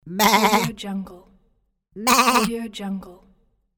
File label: cute cartoon sheep; applehillstudios